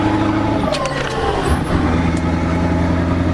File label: scania 143